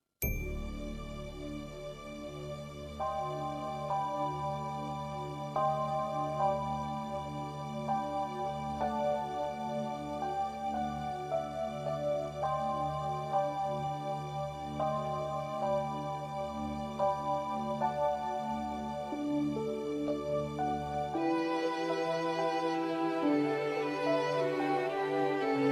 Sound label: backing track